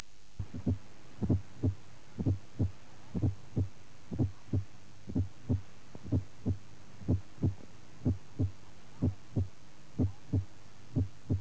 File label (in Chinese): 舒张晚期奔马律; XinFeiTingZhen